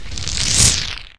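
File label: shield FAIL, magic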